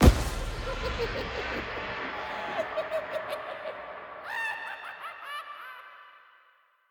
Pumpkin Witch; The Inn

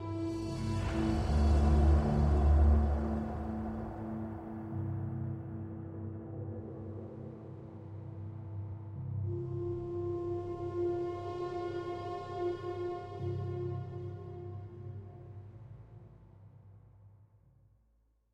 Death Stinger

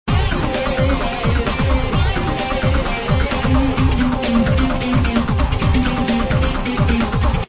BGM Event Concert; Shop Empire

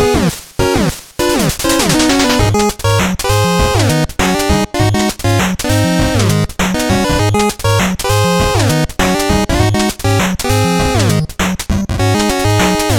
8bit Menu; gamerip; Hill Climb Racing

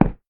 Tile short; Devour 2021 gamerip pc macos

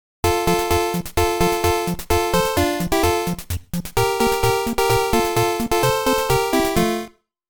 Ending; Game Sound Museum Namcot; Mappy; Mappy Land; Mappy Kids

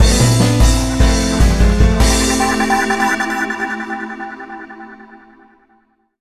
Game Over Jingle; Liberation Maiden

